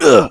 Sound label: pain, male; npc